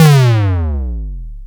Roland JD800 Internal Drums

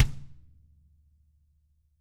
HARD, drums; ILIO; Double Platinum Drums 1

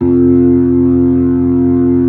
B-3, Keyboards

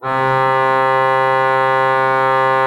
C3, Cathedral Organ, HOLZPRINZIP; Propeller Island

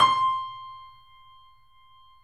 C, 3-D Audio Collection, 3D Pianos, Yamaha C7; E-MU Producer Series Vol. 5

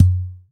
UDU DRUM; AKAI S6000 CD-ROM - Volume 5

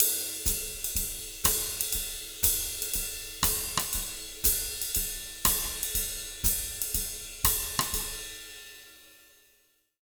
120 BBAND, Jazz Latin Drumloops; USB Soundscan vol.08; AKAI

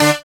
SYN DANCE, Roland; Sound & Vision - Gigapack II

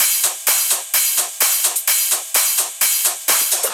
Loops 128BPM, Drumloops

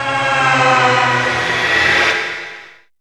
Ambient Atmos, Dance Synths

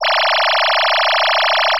sci fi; Masterbits - Switch FX

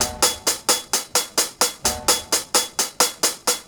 hats, drums acoustic, 130bpm, metal drum; musicradar-metal-drum-samples

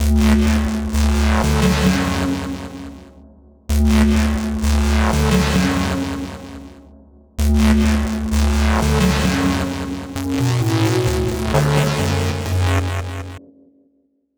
Noisy Gate, Synths; Sonic Foundry (Sony Creative Software); Modular Electronica

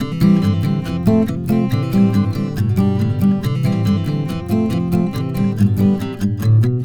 Acoustic Pop Guitar 140BPM Cmaj; Vengeance - Essential Clubsounds Vol.4

